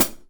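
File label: Hi-hat Acoustic pedal; Polyend; Simulacrum Sounds; 1 Shots; Acoustic and Electric; Drums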